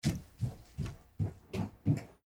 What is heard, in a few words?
footstep